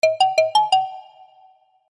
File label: Xylophone Ringtone